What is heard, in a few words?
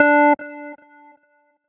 Race Start Beep; android; Pac-Man Kart Rally